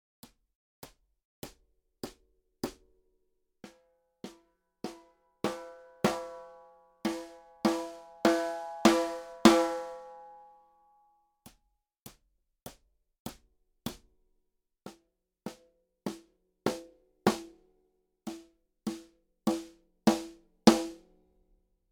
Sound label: Snare Drum - Plastic Rutes